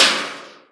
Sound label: Trash Can, Alesis HR16B